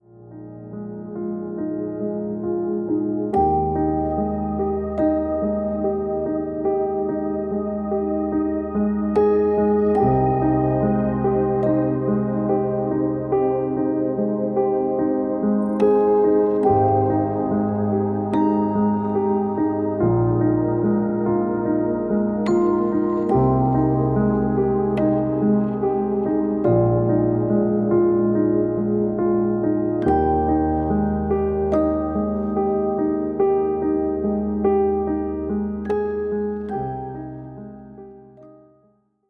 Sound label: Instrumental